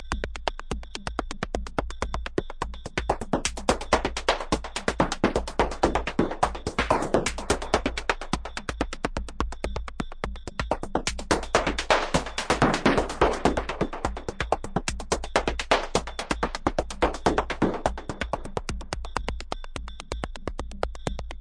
126 bpm noise click loop; drum loops and breaks; eko

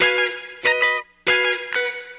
funky guitar riffer; GUITAR LOOPS

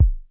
low bass, Roland SH09, drum machines

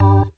short warm, Organs

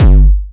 Syn Dr, Overloaded Synthetic Drums; style kits

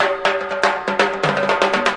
timbale runner, drum loops and breaks

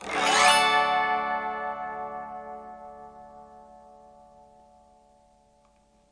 autoharp D, Autoharp Chords; Multisampled Autoharp Chords; WORLD

